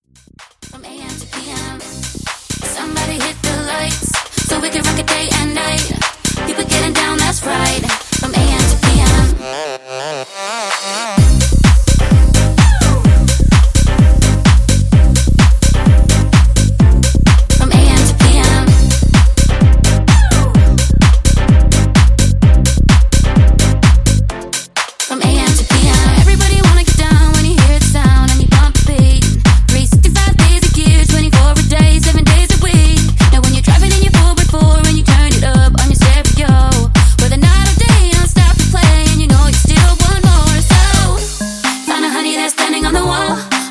Tech House Redrum; Remixfreakz

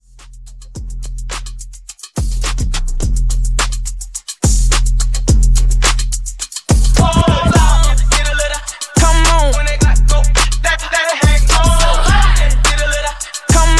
Hip Hop Redrum

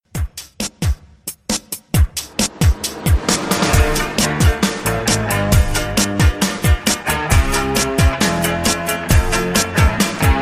70s Pop Re Drum 134

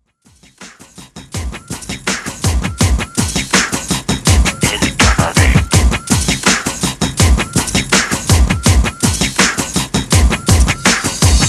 Clean Intro 82 Bpm; remixplanet

